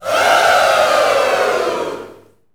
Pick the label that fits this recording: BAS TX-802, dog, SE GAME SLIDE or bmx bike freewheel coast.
SE GAME SLIDE